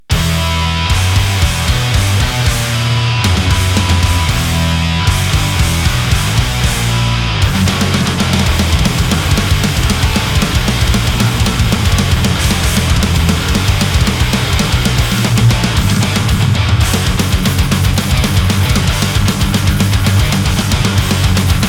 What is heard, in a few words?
Higain; kemper